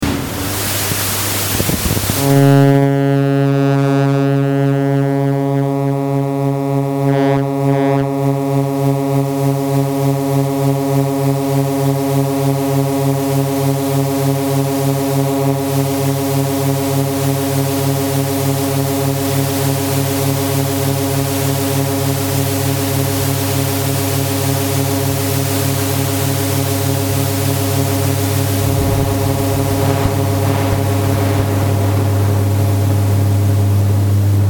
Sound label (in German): Schwebung